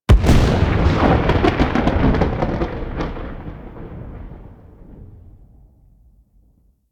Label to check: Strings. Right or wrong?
Wrong